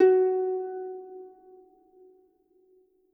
harp long, F#3; Best Service ProSamples vol.51 - Classic Orchestra 2

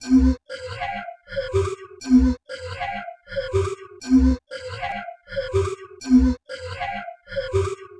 Hydraulic Melodic, Washes; Sonic Foundry (Sony Creative Software); Myths of Technology

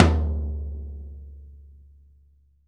P.FTOM MF, VELOCITY TOM; VELOCITY TOM 2

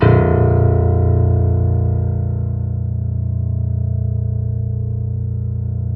PED FF A, GRAND PED; Best Service Advanced Media Trax Modern Composer

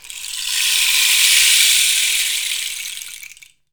RAINSTICK; WorldSounds

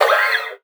FRET, FINGER MUTE; Xsample VOL-1

